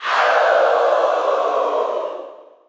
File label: Cheer English PAL; Wolf; SSBU